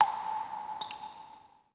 drip; Stake Fortune Fighters; XBOX

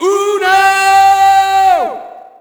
Shout, audience; UFC Tapout 2

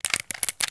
Handgun Spin

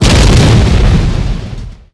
Large Exp, Explosions

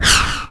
Panel Rings; Finding Nemo; XBOX

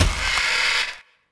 egg hit

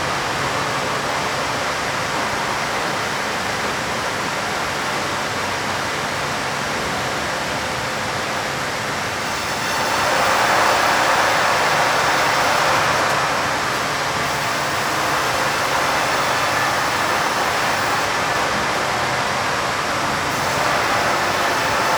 Amb Mulching Room; Pilot Down Behind Enemy Lines

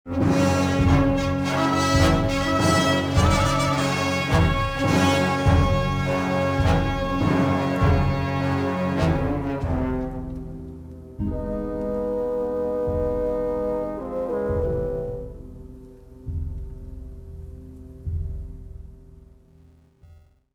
tunnels, music; Star Wars Jedi Knight II Jedi Outcast; XBOX; artus mine

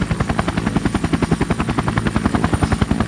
Helicopter Loop

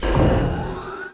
start, ships; Quantum Redshift; kutoo

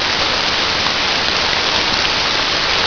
rain; Chase Hollywood Stunt Driver; XBOX

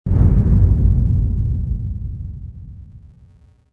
dull hit

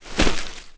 Alien Bird Flap; Aliens; Mace Griffin Bounty Hunter

